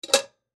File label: Teapot Lid Close, Teapot Lid Close Metal Tea; Foley; Tea Kettle; Household; Dishware